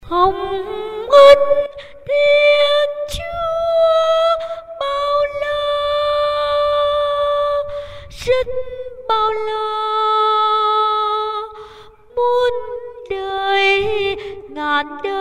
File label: tenor